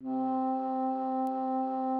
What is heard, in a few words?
Instrument